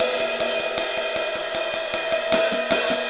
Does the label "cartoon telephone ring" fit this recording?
No